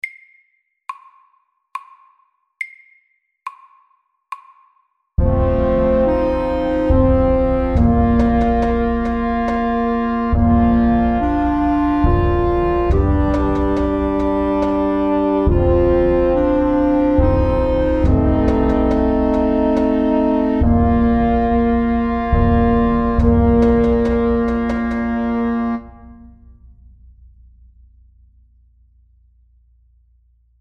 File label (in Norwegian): Vikingdans SLOW 70